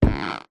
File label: foley footstep single boot ladies wood floorboard creak; zapsplat